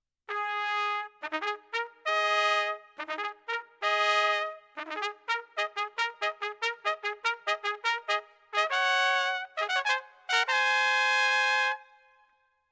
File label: Herald Trumpets; Knighting